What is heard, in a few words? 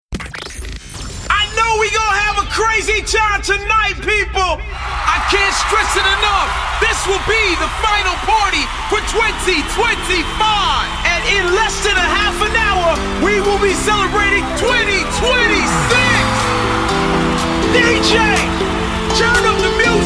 Disco, 122; Happy New Year 2026